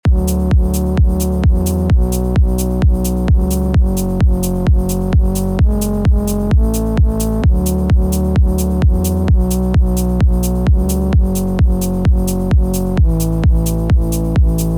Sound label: loop; GIMMICK; F# minor; 130 BPM